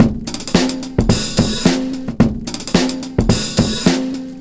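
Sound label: DRUM 109, HITBREAK 109, Breakbeat; Best Service ProSamples vol.24; AKAI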